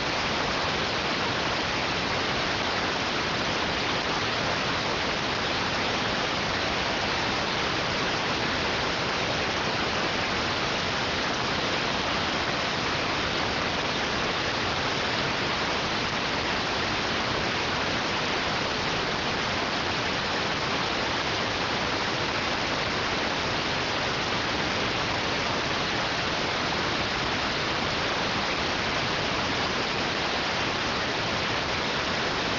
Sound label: rtty rx